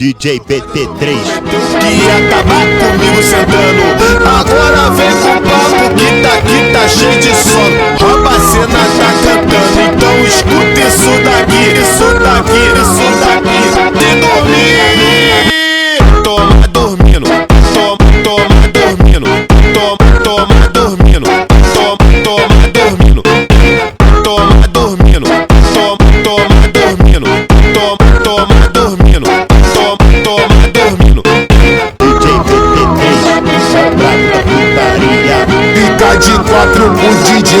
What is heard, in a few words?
HORROR FUNK Slowed